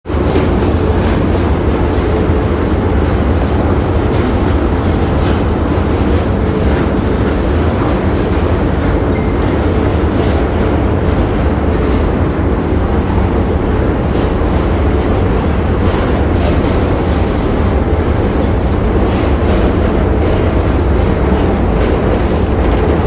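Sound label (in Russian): звук туннеля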